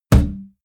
can heavy hit saturated

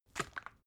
bottle syrup shake single soft; berufe; gastronomie

